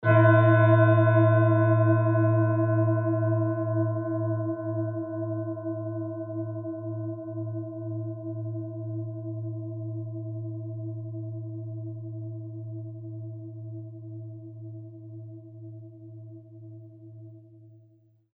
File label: tib bowl venusset